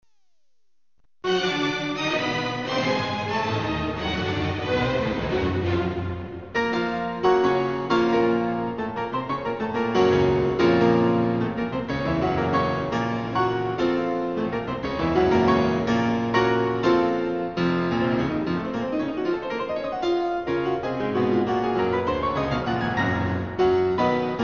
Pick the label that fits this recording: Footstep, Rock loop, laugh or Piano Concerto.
Piano Concerto